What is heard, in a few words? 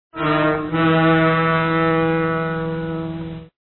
music